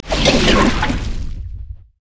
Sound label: sonic wave; LoL; leesin skin05